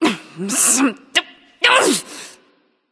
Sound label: grumble; daji